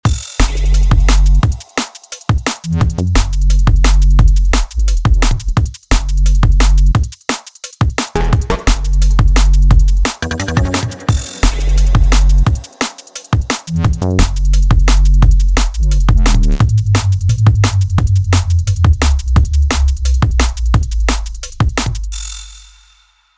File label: bass drum and bass; bass master